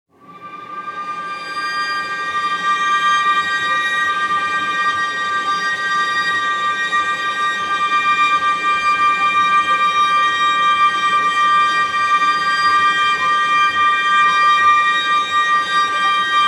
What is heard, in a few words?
Squeak in the Hinges